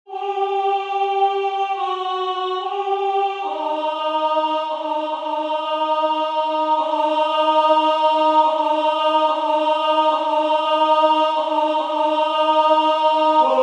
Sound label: Alto; Stars of the summer night